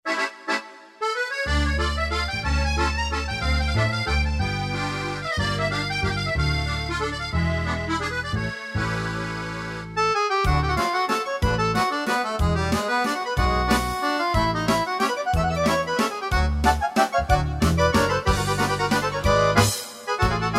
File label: accordion